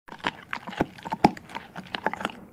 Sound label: Pet Doberman Eating; creatures pets